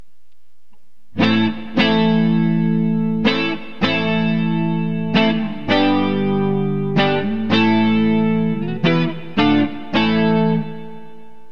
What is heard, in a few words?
neck clean; Burny